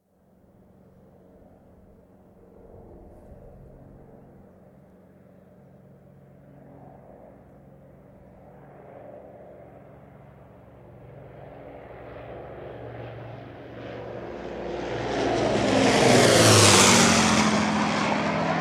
Avro Lancaster, exterior, fly low overhead (World War II bomber, 4 piston engines); Airplane Sound Effects; BBC